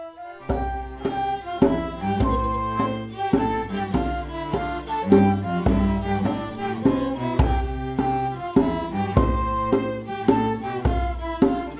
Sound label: mazurka